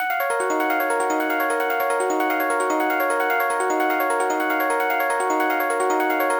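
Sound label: synth arp D sharp min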